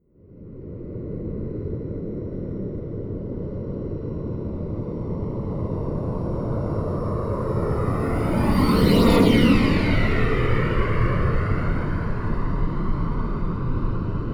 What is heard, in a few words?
plane honda private small flyby right to left close